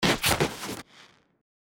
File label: destruction debris